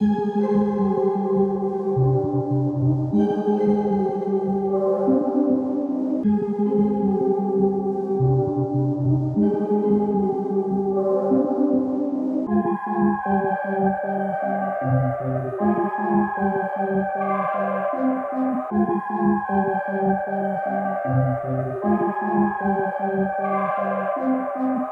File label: 77 BPM C Min, Melody; Origin; Cymatics; 2022 Melody USB Expansion